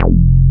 TEK F2, TEK BASS; Drums In Your FACE!

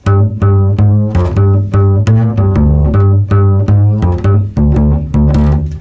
Double bass G 165bpm, Bass Loops; Total Drum&Bass

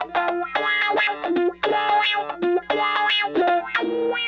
Raw Funk Guitar

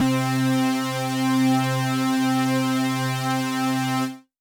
Pad C, Synth Prophet One Shots; Electronisounds

